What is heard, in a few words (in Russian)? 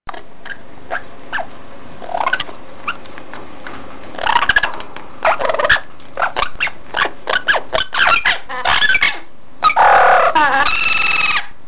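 попугаи кричат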